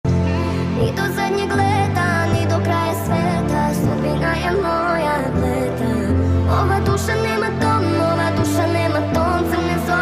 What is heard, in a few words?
english ringtone